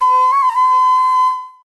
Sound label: Panflute